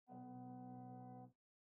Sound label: Air Conditioner Far Angle